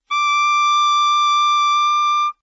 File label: E7 alt, saxophone tenor